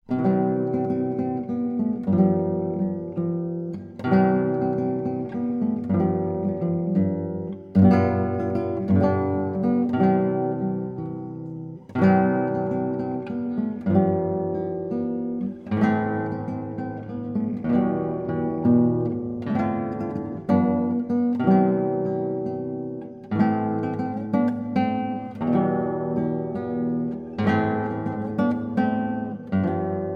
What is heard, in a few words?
guitar; Solo Guitar Compositions